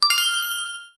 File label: celebration short notific; events